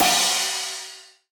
Crash; Uppercussion Conundrums

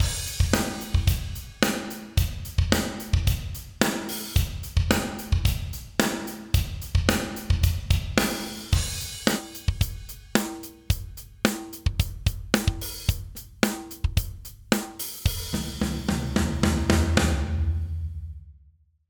slate digital verbsuite classics plate

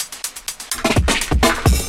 drumfill 126 hatstack

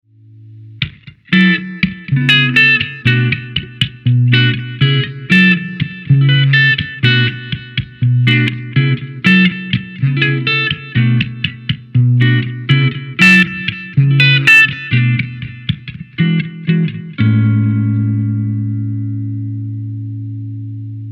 jazzy funky; magix vandal

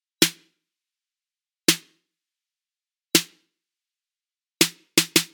Snare

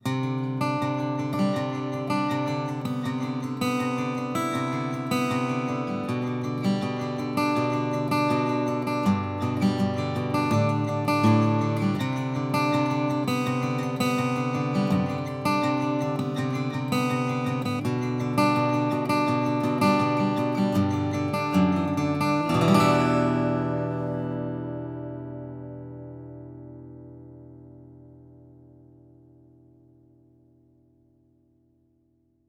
picked; Baton Rouge X11S/OME

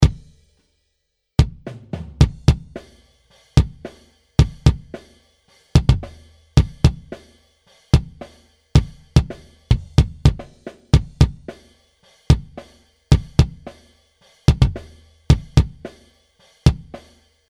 bd not gated

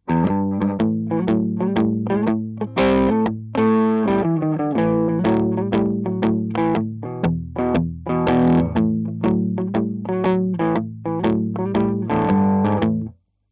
overdrive

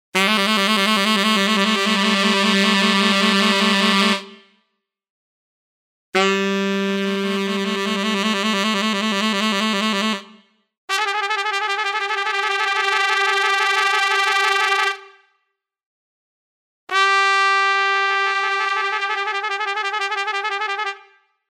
horns xfade; chris hein horns pro

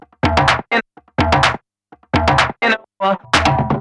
tribal perc 126; malaya; Wave Alchemy Loop Tools and Grooves

